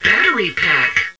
BATTERY; THEXDER

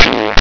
FART

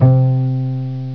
STRING B

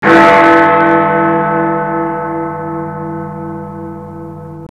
BIGBEN; SOUND FX; cubase

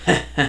HAHA; JOSEPHIN

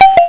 KEY BUTT; CLICKS

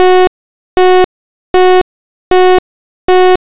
PHONE

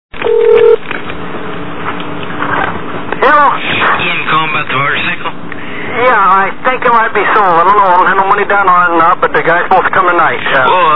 Motorcycle; Phone Losers of America

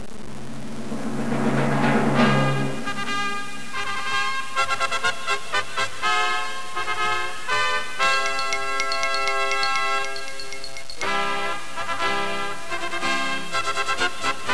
FANFARE

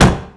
TYPE

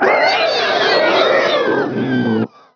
mutant

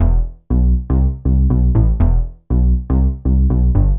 BASS, DANCE; MAGIX

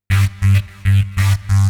ZIPPER, KEYBOARD, HOUS TEC; MAGIX

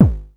DANCE KIK; BEAT2000

